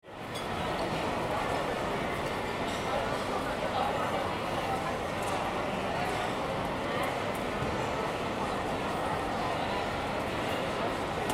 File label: Garden market ambience in London England